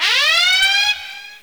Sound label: siren, Vehicles